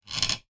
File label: wood squeak creak; foley; zapsplat